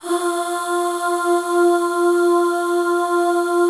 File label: F3 FEM AHS, FEMALE AHS; Northstar - New Gold VOL-1